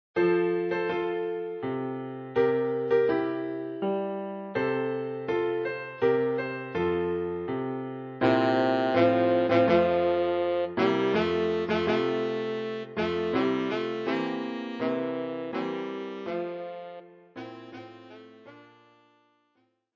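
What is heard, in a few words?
tenor saxophone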